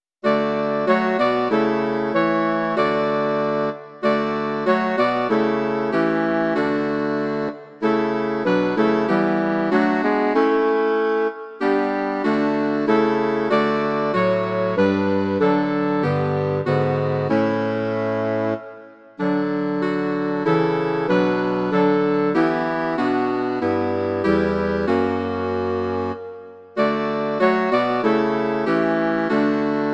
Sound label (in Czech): klarinetové trio a fagot; Můj Pastýř Pán Bůh sám; Imaginativní verze